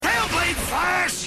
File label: Tailblade Slash; Gaskunk; Robots In Disguise television show